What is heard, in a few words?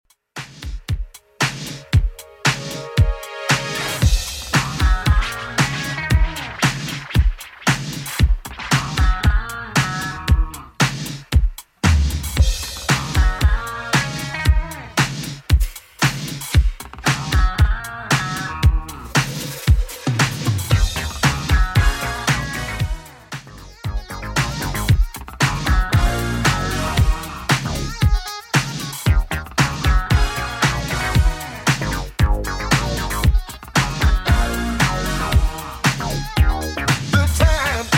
80s Redrum 115bpm CLEAN